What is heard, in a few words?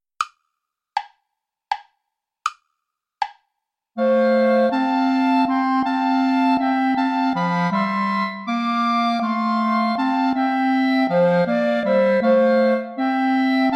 herr jesu christ dich uns wend play along without bass clarinet